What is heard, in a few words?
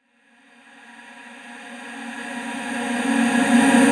Reverse Vocals A#; Effects; Vengeance; Essential Clubsounds Vol.4